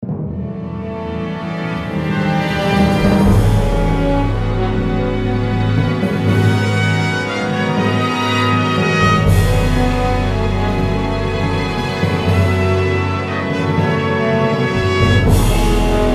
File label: Storm; Earth Defense Force 2017